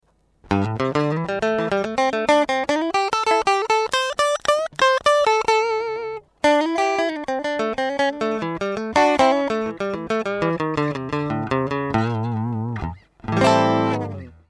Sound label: Middle Ironstone Platinum